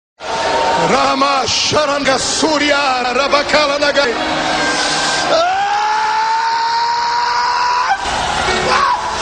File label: ahhhhhhh pa